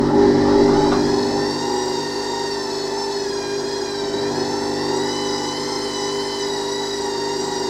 Whirr, washing machine; Wash Spin Cycle

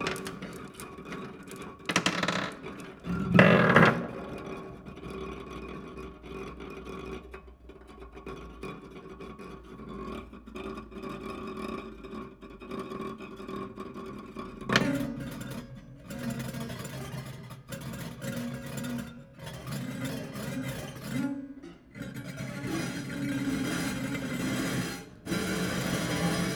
Marble Oscillate, Piano Destruction

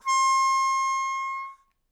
Melodica Long, Long Notes Chromatic

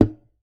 Classical Guitar Extended Technique

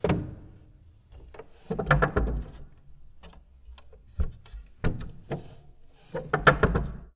Music Stand, Piano; Piano Miscellaneous

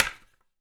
Palette Snap, Wooden Palette

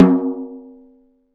Snare Drum; Percussion Samples

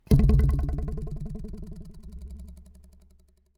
Ruler

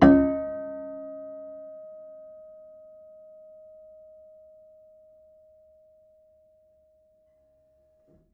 Harm Mid, Piano Harmonics

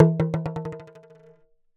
Talking Drum Bounce; Percussion Samples

